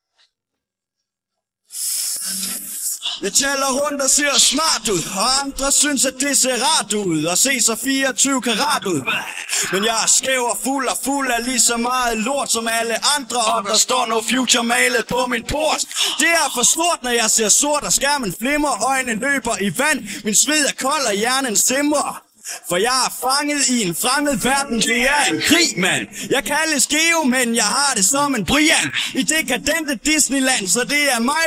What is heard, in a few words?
vocals